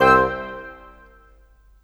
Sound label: Cinematic Winds; Sonic Foundry (Sony Creative Software); Orchestral Series VOL-3; Cinematic 20